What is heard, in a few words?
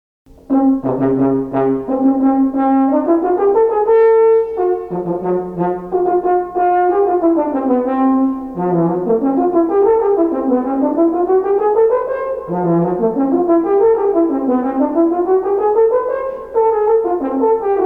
fr. horn